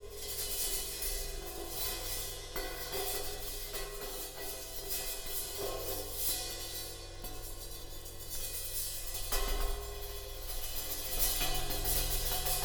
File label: Cymbal; Percussion Samples